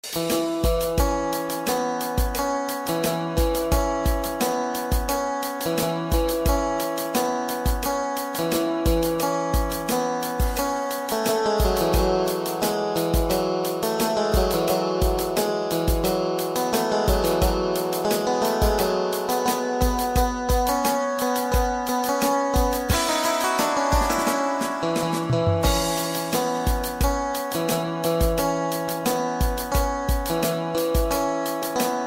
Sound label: indian army ringtone army ringtone indian army instrumental ringtone; army bgm